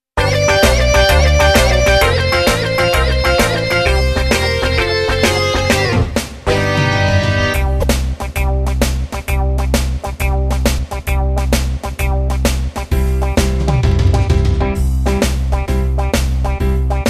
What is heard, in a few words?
Blues